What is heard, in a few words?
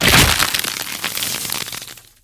host submerge, creatures, headcrab reviver